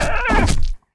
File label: monster